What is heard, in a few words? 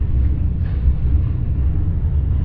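loop background noise short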